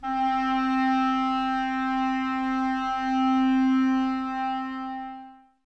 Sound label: clarinets, Wood Winds